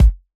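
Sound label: Bd, bass drum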